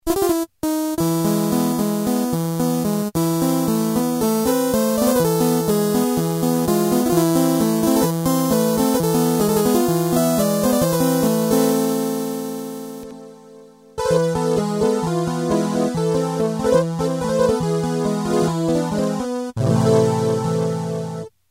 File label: harpsichord, Technics SX-K450 Poly Presets